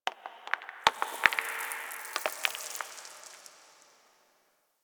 Moon Dust Fall Small, Ambience; Warframe; Orokin Moon

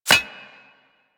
Bullet Impact Armour; Warframe; Weapons

